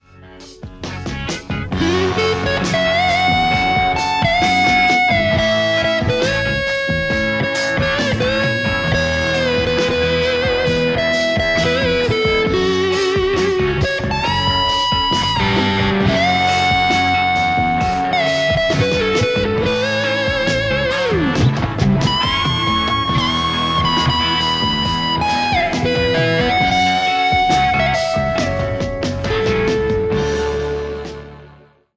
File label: les paul; poulin lextac